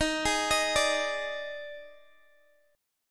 D sharp Major 7th Suspended 4th, D sharp, down, Guitar, DADGAD